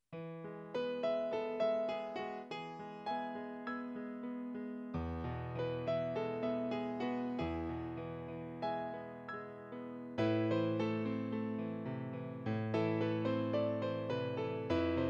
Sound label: Ballade